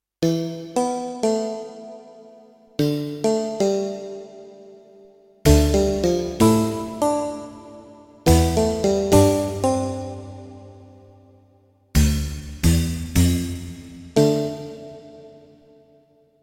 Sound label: Deep Spike; Kawai K1; Bank 2 card A